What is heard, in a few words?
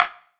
perc organic thoock; percussion